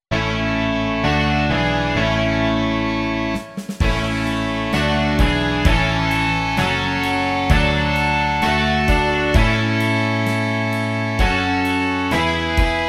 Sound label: rock